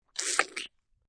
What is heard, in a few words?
Slow Knf In Out, A knife stuck in and pulled out of flesh.; Stabs and Slices; Combat